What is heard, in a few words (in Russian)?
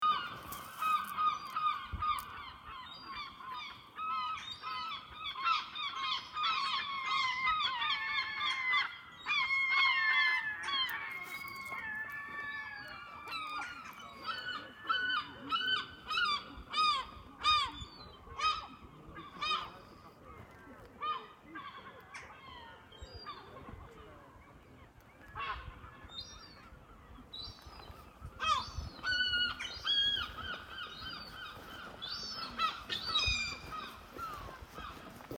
chaiki, zvuki chaek